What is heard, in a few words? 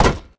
iron run